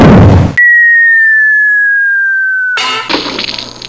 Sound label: cannon; 20,000 Leagues Under the Sea